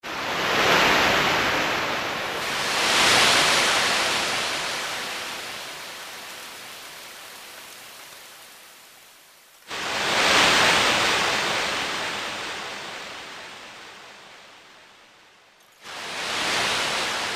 Big Waves, Roland PN-D50; Roland PN-D50-04

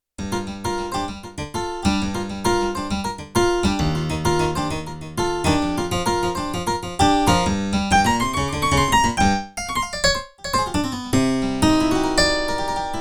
Filtered harpsichord; Yamaha TX16W

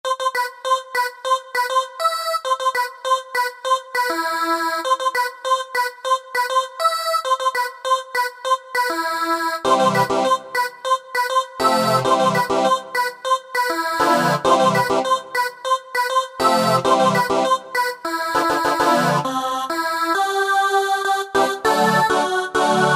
CLEAR VOICES; Best Service Alpha Dance II

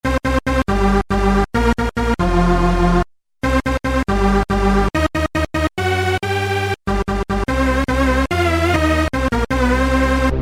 Dance String, Roland SR-JV80-06 Dance

